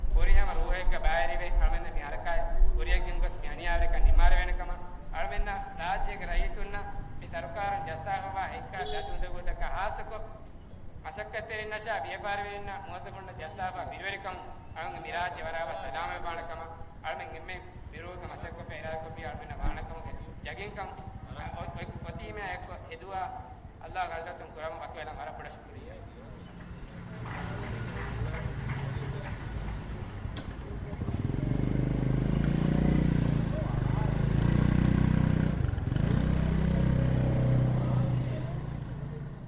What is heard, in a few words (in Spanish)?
television callejera hulhumale